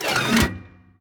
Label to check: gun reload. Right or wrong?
Right